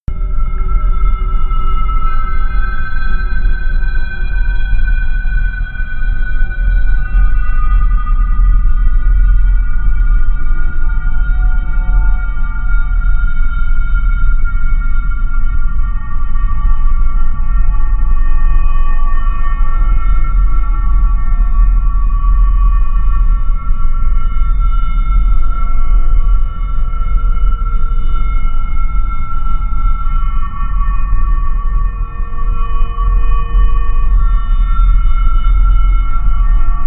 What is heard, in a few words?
drone scratchy siren